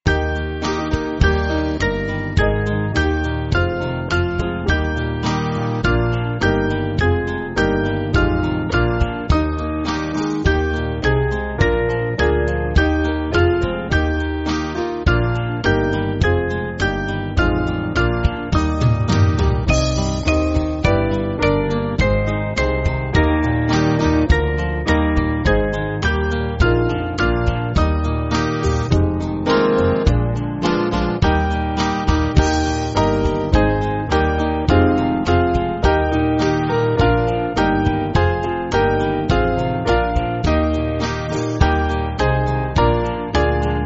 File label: Band, church music; We All Believe; Metrical; Wir Glauben All